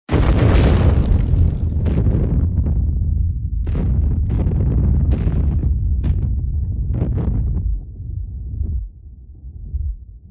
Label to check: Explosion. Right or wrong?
Right